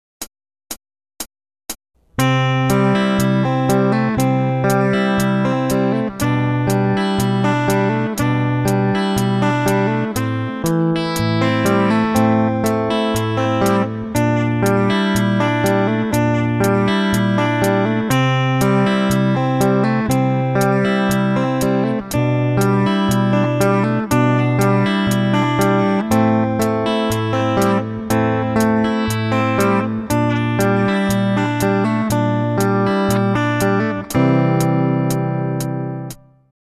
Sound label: Rhythm Guitar